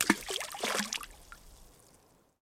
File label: golf ball hit water